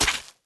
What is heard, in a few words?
fruit slice